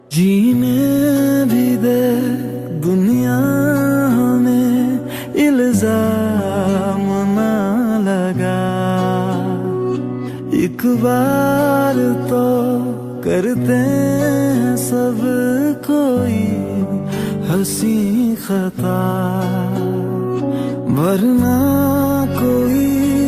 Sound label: New Romantic Hindi Song Ringtone